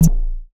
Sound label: Single UI Blab